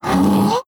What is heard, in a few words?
Howler Death